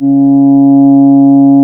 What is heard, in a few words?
C#, Synclav FM, WIND; Big Fish Audio